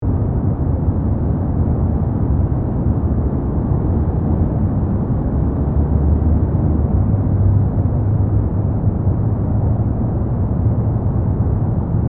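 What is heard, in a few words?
Ambience Traffic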